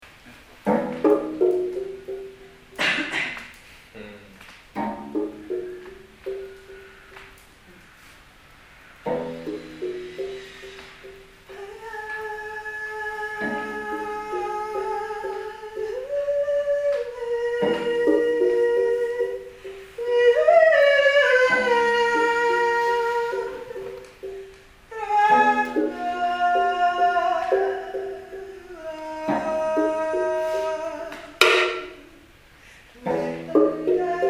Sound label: duet